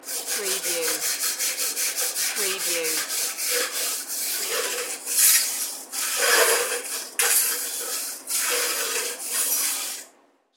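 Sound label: Scraping Burner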